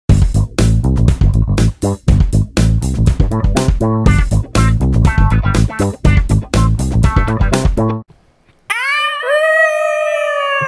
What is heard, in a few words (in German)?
Wolfsradio Jingle